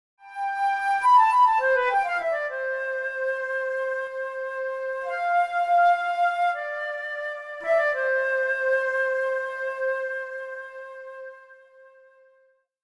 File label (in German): Floeten Djingle